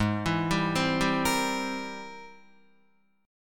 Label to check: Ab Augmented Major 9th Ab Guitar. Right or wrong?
Right